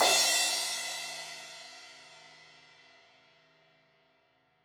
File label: Crash 16in edge, Cymbals; Deeply Sampled Drums